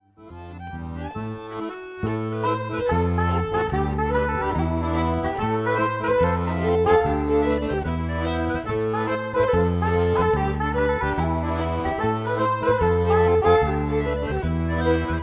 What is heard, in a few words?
laride, balfolk; ViernheimJam